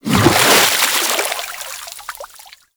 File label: Pirate Kraken Tentacle Water Splash; Warframe